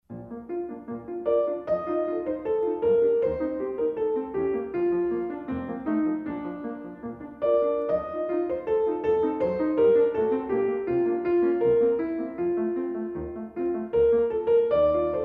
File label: Song without word Op. 85 No. 6 In B Flat Major Allegretto Con Moto